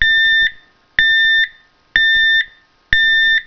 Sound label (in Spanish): intermitente